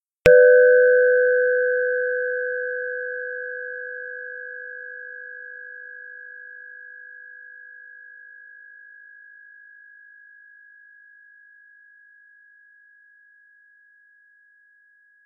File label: collide 1 electron; sound of two electrons clapping